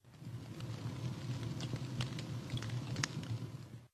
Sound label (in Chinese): 营火燃烧噼啪声